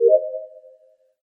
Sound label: ping short message ringtone